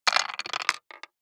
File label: DICE on Wooden Tray, Throw and Roll, Standard, 5 Five Dice; Samples; ArticulatedSounds; DICE Sfx Party (Extended)